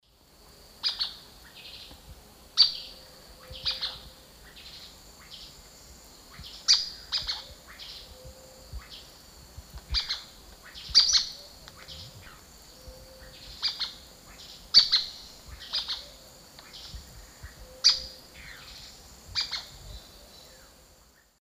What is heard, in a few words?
Automolus infuscatus, calls pair; S. America; Eastern Lowlands